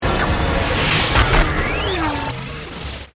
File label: Stinger Digital, Stinger, Digital, Swirl; Production Element, Imaging Element, Accent, Transition; BlastWave